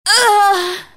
death, female; csco; csgo; zbm3

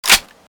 clipout; psg1; csco; csgo

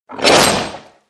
zombie normal male attack; csco; csgo

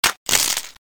rifle clip out; csco; csgo; weapons; sprifle

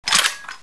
foley, weapons; csco; csgo

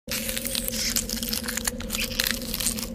freeze, weapons; csco; csgo

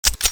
luger slide back, weapons; csco; csgo

